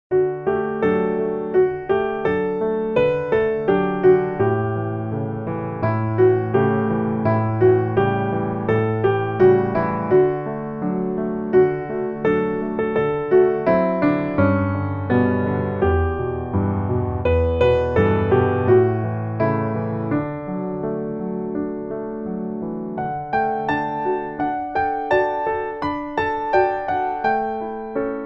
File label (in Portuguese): piano